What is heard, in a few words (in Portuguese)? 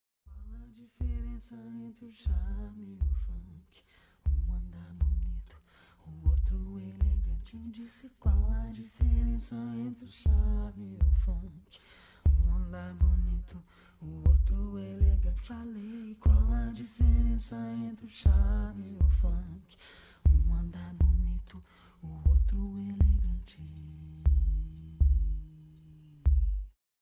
rap da diferença; abrigo